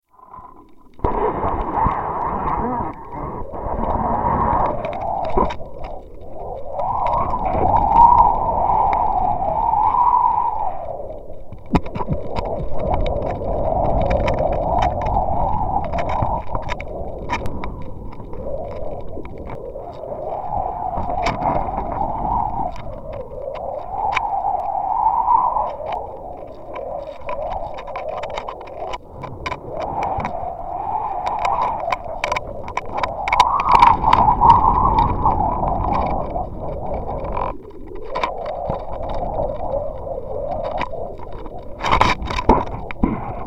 kite, monaural recording, contact mic on string of a flying kite; Sheepshead Beach, CA